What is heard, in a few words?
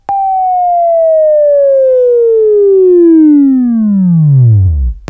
WAV Right, Sweeping; Sweeping Training program